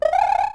raccoon; smas-smb3

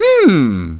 hmm; mario

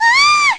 scream; peach

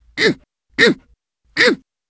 mario carrying fruit; smsunshine

